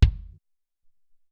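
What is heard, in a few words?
Solid Kick, Alesis SR-18 Drum Machines; 300 Drum Machines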